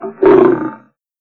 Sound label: lion sounds